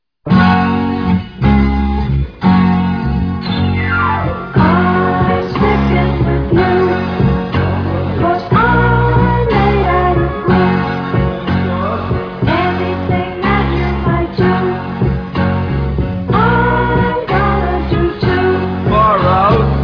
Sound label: sticking